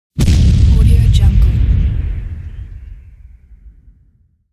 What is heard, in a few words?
heavy artillery tank shot